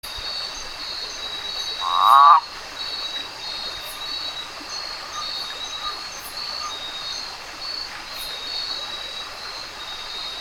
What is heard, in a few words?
Rentapia hosii